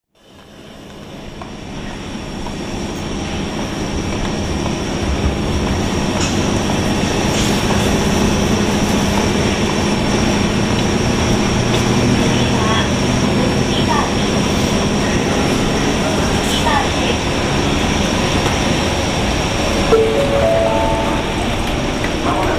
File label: japanese night train